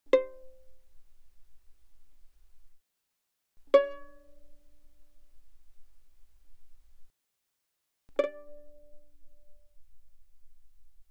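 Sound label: Violin pizzicato fortissimo sul A C5 Bb5; Strings; violin2012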